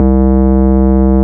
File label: ORG, Farfisa